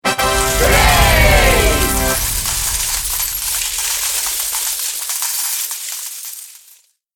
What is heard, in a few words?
Money Winner, Triumphant brass fanfare with drum roll, falling money and cheers.; Musical Sound Design